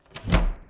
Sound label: Door Open